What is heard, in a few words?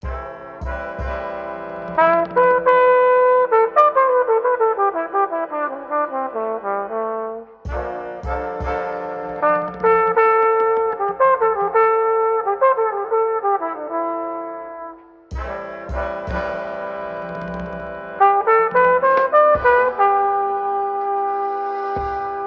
horns unchopped lead ins, phonky loops